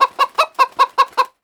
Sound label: chicken panic; Counter Strike Model Pack; CS:GO